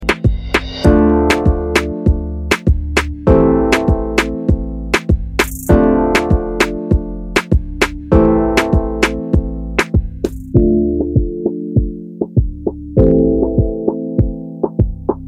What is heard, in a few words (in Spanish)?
instrumental